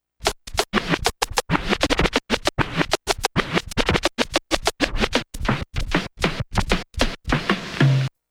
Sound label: zhiguly scratch